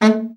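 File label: baritone, BARITON SAX; Best Service; Brass Super Section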